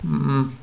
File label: nervous, hostage reactions